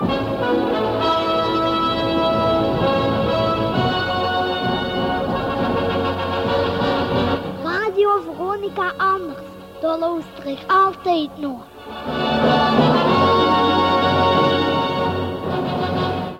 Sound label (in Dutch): Jingle; Station Calls